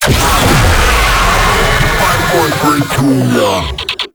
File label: Death, Vocals; Warframe; Proto Infested; Babau